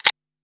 wheel start fast; Fruit Fiesta; Wazdan

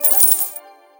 collect money; Sizzling 777; wazdan

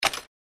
reelspin click; Fantasy 777

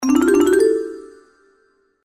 multiplier 2; Horoscope

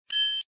CHING; games; Book Of Ra

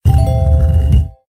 EXTRA OFF; Lucky Lady's Charm DX6

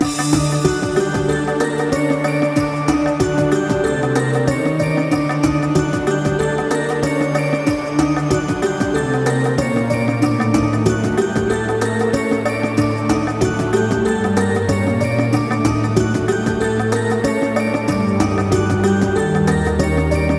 init melody; games; Book Of Pharao

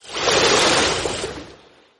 bonus symbol water in; Hungry Shark; Wazdan

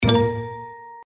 bonus; Stocked Bar

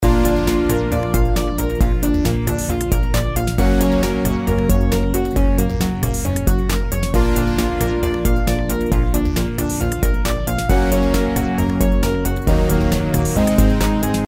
robots intro bgm

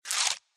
gambling mouseover; games; Fenix Play 27 Deluxe; Wazdan

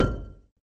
wheel stop; Magic Hot; Wazdan

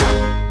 freespin wild; Wild Guns

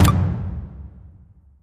bonus counting; The Golden City

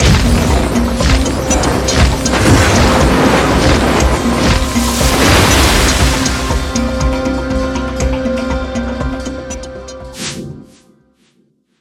superwin; games; Fenix Play 27 Deluxe; Wazdan

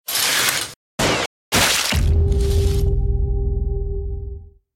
gambling lose; Wild Guns

